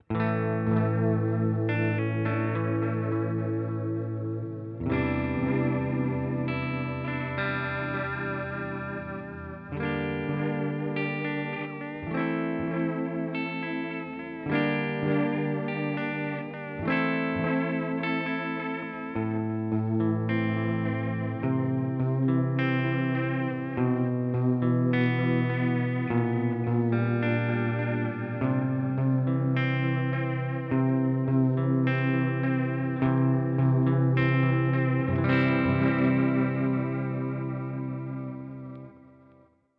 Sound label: Crazy Tube Circuits Time MK 3